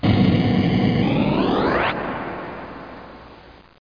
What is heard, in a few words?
Phase